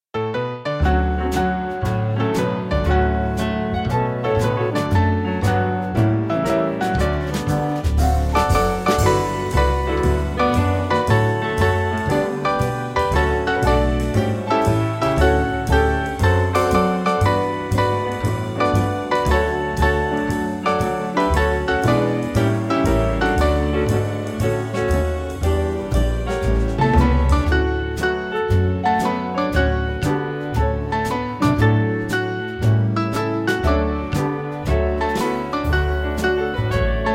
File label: spiritual band, Free Music Backing Files for Churches